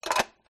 tray plastic small insert decisive rattle click resonant hollow; skyclad sound